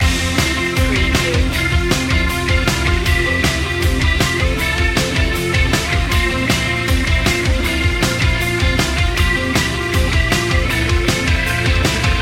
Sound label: indie rock party loop